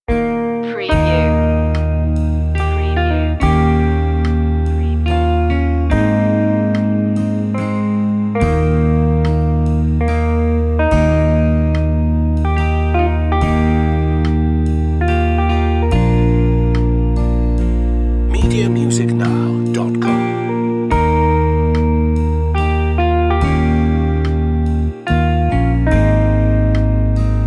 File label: amazing grace regular guitar verse 72bpm, A gentle and reflective electric guitar version; easy listening